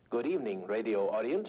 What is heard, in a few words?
audience